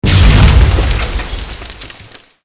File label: other defensive system hit; CosmicRage; ships combat